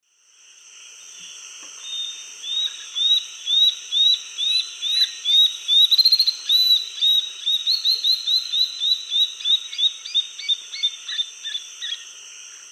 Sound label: Notharchus tectus, song; S. America; Eastern Lowlands